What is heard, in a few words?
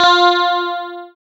GUI, E-Guitar